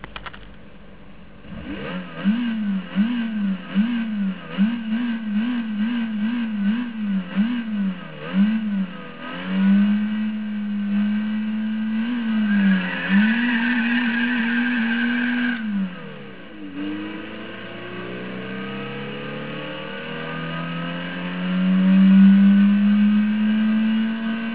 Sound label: Volkswagen Golf I GTI